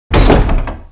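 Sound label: door close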